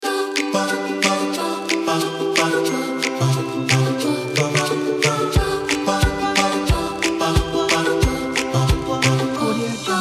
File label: happy upbeat